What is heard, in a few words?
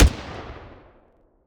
hand Cannon; WEAPONS; Captain